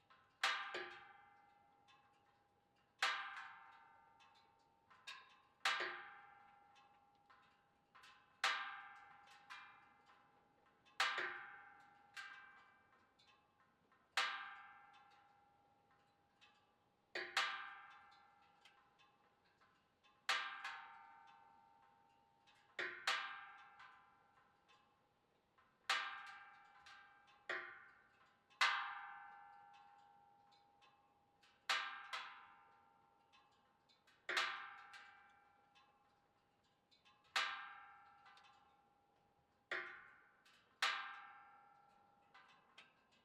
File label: Truck Catalytic Cool, Transportation, Automobile, Truck, Catalytic, Converter, Cool, Down, Metal, Creaks; BlastWave